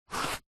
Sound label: shoe ballet foot slide out; zapsplat; foley